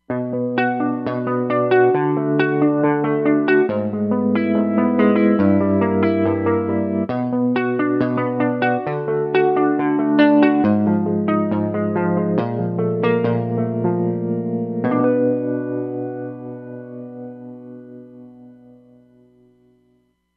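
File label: Clean Guitar, Roland Fantom XR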